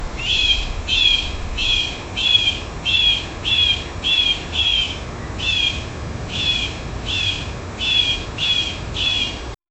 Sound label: a hawk flying and